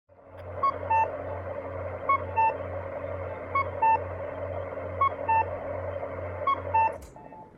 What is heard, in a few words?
cuckoo